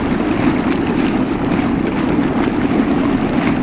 surface mud loop

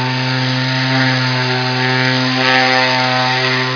SAW, Power tools

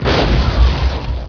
crash jungle

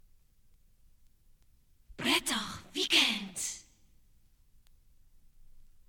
topformat maxi jingle single; topformat maxi jingle single4

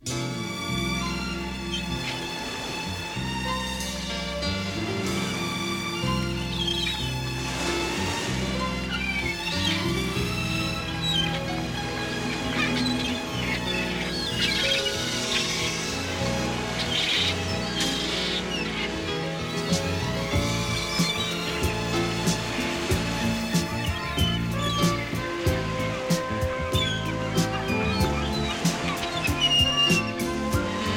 topformat maxi jingle single

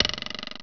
TOING; Zoemtonen hisses pingen woesjes tikjes

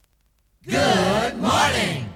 jinglepackage; topformat LP jinglepackage 2

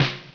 SNAP

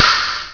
SHOT; sound effect; Gun shots

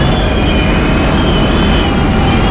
pod steady hi loop

